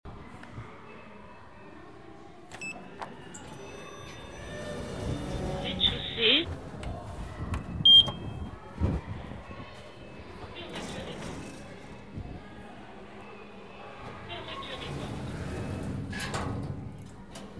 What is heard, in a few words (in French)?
ASCENSEUR REZ DE CHAUSSEE